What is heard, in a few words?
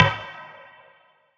percussion clap; Claps